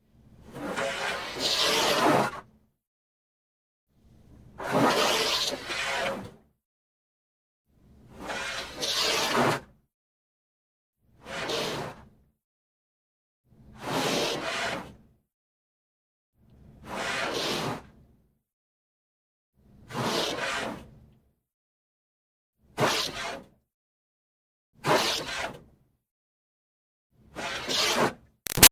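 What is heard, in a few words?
Water Spray interior Car bys Corner; Car Wash; Spraying; Objects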